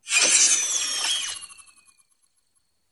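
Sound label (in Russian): Zvuk stiratelya pamyati nejralizator, memory eraser neuralyzer sound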